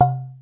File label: mallets